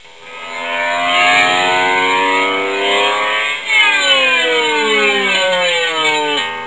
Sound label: plane